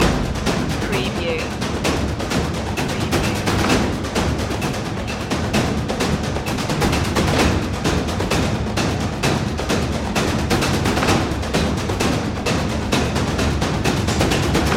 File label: trailer percussion loop